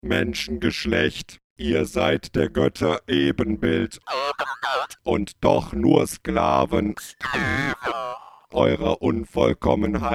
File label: ring voice